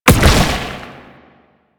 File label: Proto Infested Brachiolyst Teleport Start; Warframe; Abilities